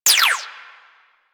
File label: Fire Shot, Weapons; Warframe; Boar Prime